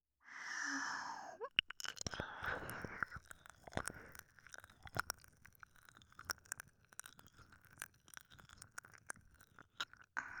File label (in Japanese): 耳舐め, ASMR; SEなし